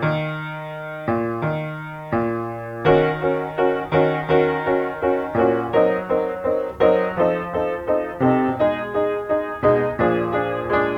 piano

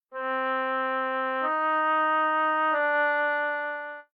oboe sad

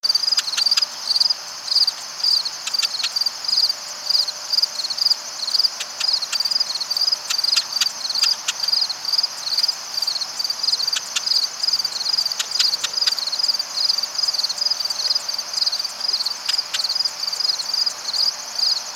Cistothorus palustris - Marsh Wren

